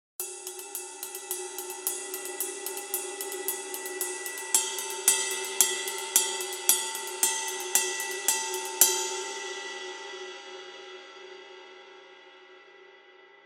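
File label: Ride 21, cymbal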